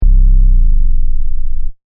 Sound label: Techno Trance Sub Bass Loop In C 142 Bpm